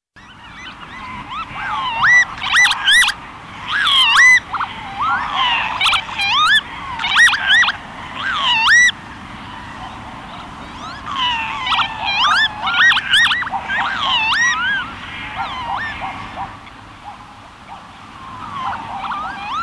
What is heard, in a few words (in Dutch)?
kieviet